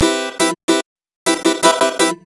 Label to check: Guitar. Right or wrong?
Right